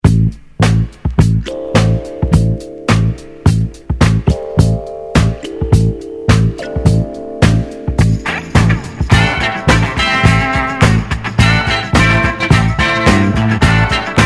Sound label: Kalimba Story